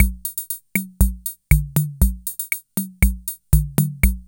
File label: RUMBA, KR55; INIS - Funky Xtreams; KR55 LP2